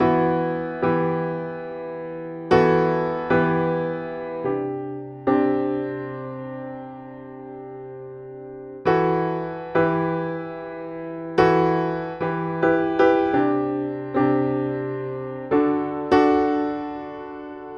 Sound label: Country Piano (Verse 1 Alt); Digidesign; Pro Tools Essential Loops